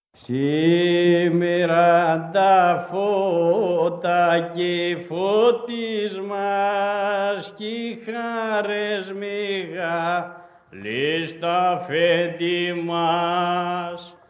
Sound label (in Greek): Κάλαντα Φώτων Φουρνάς Ευρυτανίας